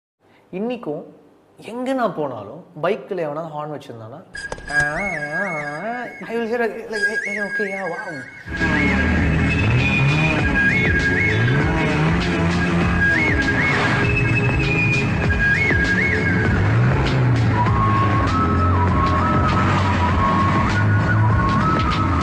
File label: bike bgm; polladhavan; bikelover; ttf